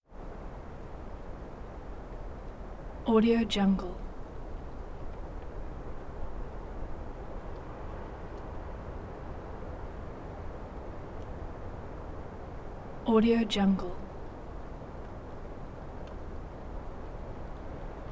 wind constant soft airy presence

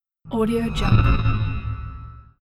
riser

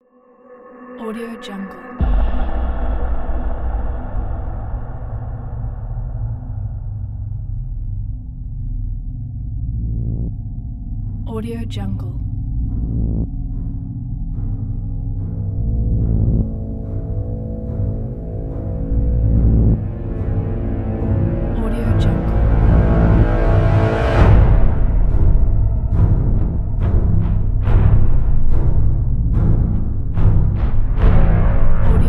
dark battle march

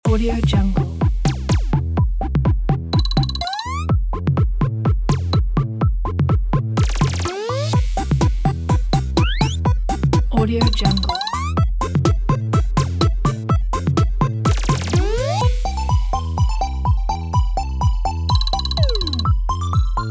8 bit retro game music

